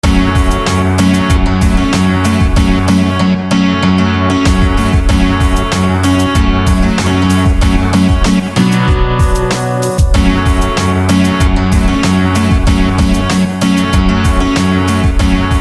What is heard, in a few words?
Guitar; Raid; SFB FX